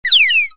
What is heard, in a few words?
cartoon slide; TECKNAT